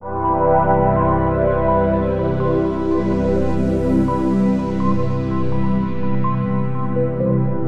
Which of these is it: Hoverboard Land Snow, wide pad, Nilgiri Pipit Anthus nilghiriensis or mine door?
wide pad